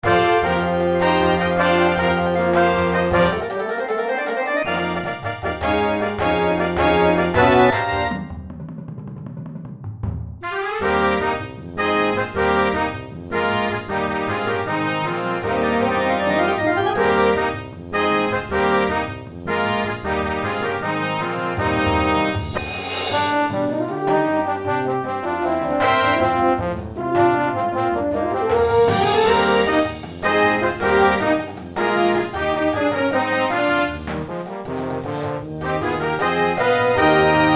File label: Cartoon